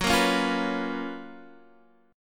Gb Major 11th Guitar Half Step Down; GbM11

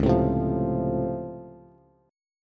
Db Augmented Major 7th, down, Bass; strummed; JGuitar

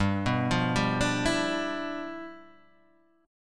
G 13th G, chord

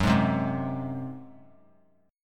F sharp Suspended 2nd Flat 5th F sharp, Chord; strummed; JGuitar

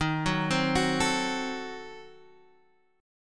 C Diminished 7th Eb, chord

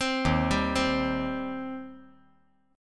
D sharp 6th, D sharp, down; arpeggiated; JGuitar

